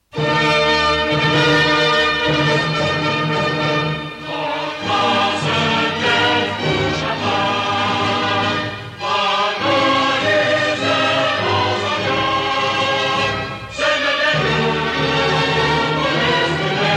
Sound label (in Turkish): Istiklal Marsi